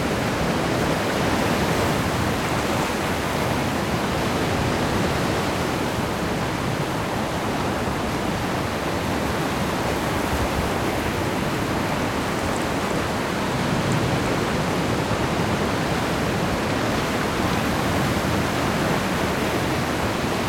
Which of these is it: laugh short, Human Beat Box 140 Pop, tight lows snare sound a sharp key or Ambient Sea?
Ambient Sea